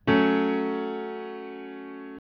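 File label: F sharp minor lower, chords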